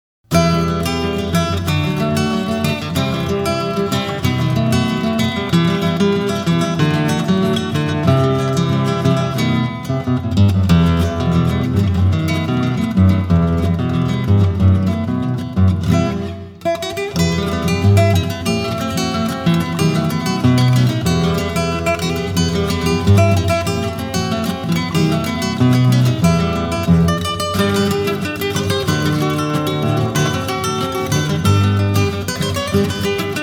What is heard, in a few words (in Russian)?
solo na gitare narodniy tanets